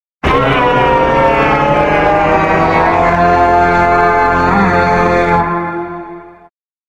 goat horn

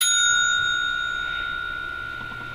call bell ding

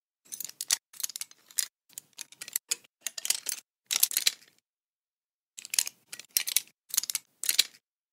lockpick

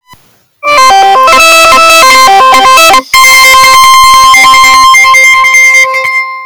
slots

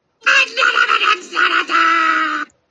a very strange sound that fan made

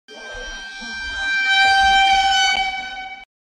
creepy meme sfx

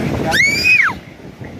scream

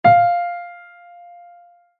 F5 piano note

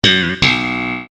sitcom transition bass riff; seinfeld

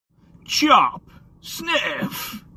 chop sniff

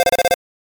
phone call; undertale

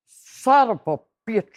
angry grandma sob

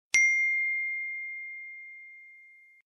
iphone text notification sound

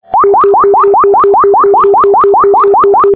alert; lifeflight

